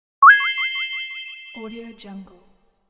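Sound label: menu click signal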